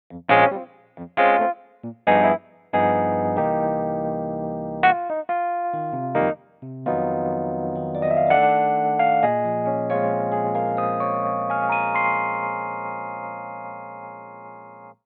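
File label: supernatural electric piano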